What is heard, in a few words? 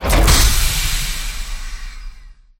pod open; substation; scripted sequences